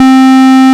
MONO POLY

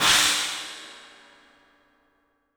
CYMBALS; 3D Sound; 3D Drums

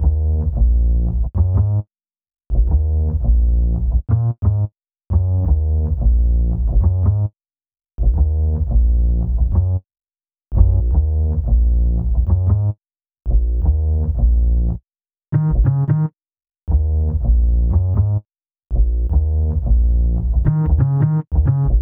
Bass, Hip-Hop

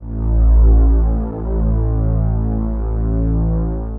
Low Analog String (single note)

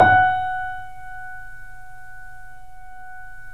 F#, 3D Pianos, Boesendorfer; E-MU Producer Series Vol. 5; 3-D Audio Collection

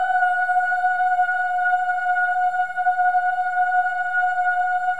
BOYS OOS; Spectrasonics; Symphony of Voices

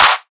drum clap gated and crunchy; Virtual Riot; Drum Hits

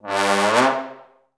TB GLUP C2; Best Service; Peter Siedlaczek's Advanced Orchestra

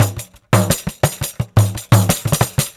Pandeiro, 4-4 Drum Loops, Perc Loops; Drum Tools

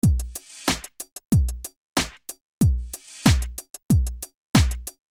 Beat

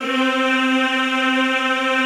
C3, Classical Choir; Best Service; Extended Classical Choir

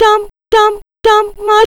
TAMTAMTAM, ADLIB WOM, Funky Vocals; USB Soundscan vol.18

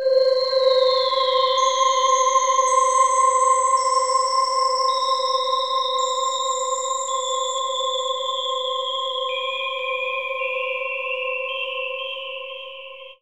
SPACE AT, ATMOS

